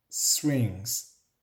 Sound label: swings